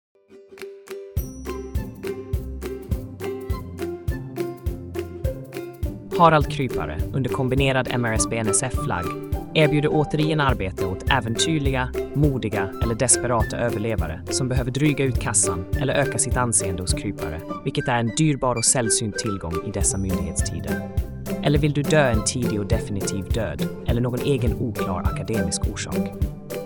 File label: Jingle